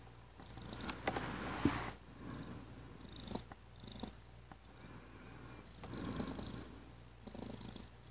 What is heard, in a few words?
Purring